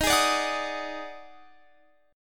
Eb Minor Major 7th Double Flat 5th, Guitar, Open F; EbmM7bb5; strummed